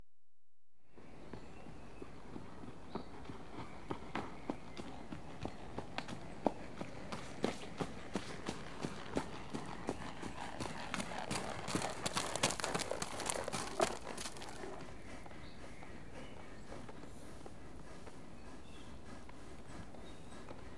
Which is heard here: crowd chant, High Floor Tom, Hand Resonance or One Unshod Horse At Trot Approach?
One Unshod Horse At Trot Approach